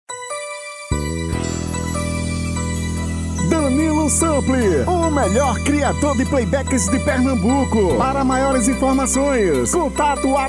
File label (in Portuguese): Versao Brega Tom Feminino